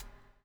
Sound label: BUBBLE; Spectrasonics - Backbeat